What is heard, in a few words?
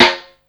ragga snare drum; SNAREDRUMS